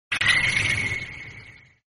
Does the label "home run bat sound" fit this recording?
Yes